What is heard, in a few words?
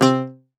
Greek Bazouki, Plucked Strings